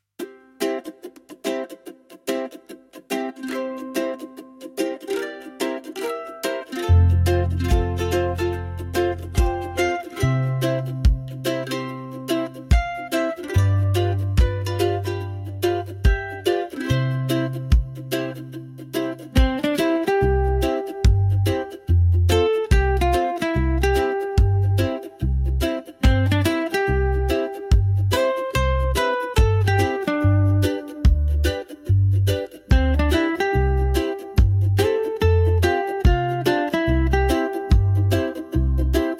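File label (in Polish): Muzyka Hawajska; Mele Hawaii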